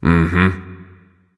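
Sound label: non-verbal agree; smite; voicepack; houyi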